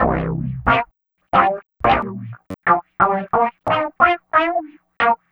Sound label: Funk Junk, Leads And Riffs; Sonic Foundry (Sony Creative Software); Synchro Funk